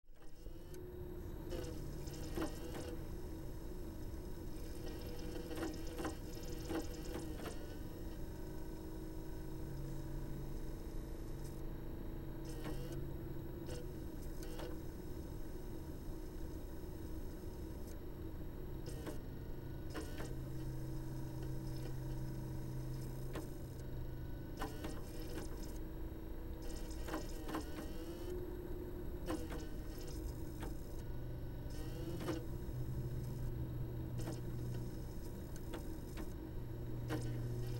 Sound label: noise; dvd noise mixdown